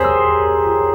Piano Chord

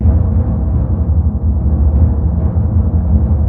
TMP C#, Synclavier Percussion

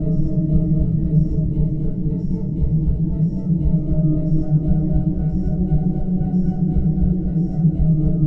Vox FX

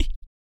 BOOGA BRETH, Vocal Planet Blues & Soul; ILIO

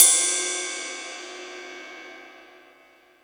Jazz Drums, E-MU Pro-Cussion; drum machine

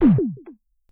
synth drums, Casio SK1

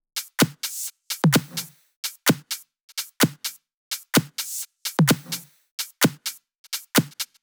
Loops 128BPM, Drumloops

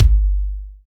RUMBLE KICK; Drums In Your FACE!

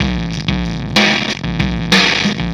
System Overload Beat, Processed Drumkits

